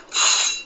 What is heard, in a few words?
snikt